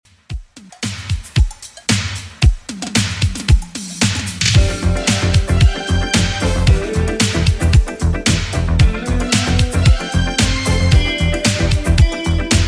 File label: 80s Redrum 113bpm